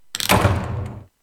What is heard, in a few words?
notification; monster hunter